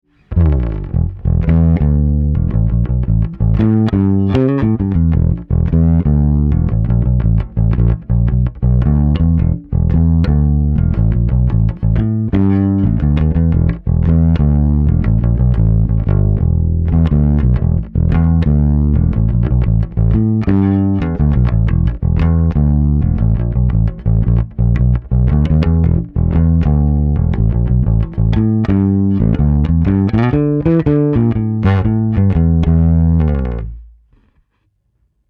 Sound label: ampeg PF 50t P Bass Finger Drive